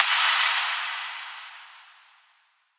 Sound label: Impactful White Noise Down; trap; FX; Cymatics; Trap Starter Pack